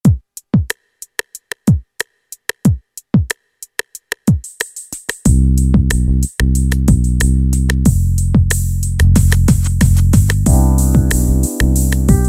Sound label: Yamaha DJX, Styles; Solid